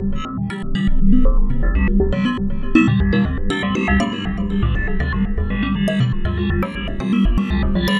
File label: Wind Klank Arp, Synth SFX; Sonic Foundry (Sony Creative Software); The Electronic Music Manuscript Collection